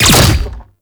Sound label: Finisher Impact; Warframe; ManInTheWall